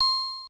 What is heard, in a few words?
Get Rupee; Rupee count finished changing (plays after the previous sound finishes looping)